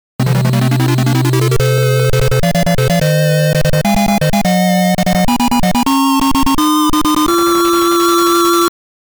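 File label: opening fanfare; Downtown Nekketsu Koushinkyoku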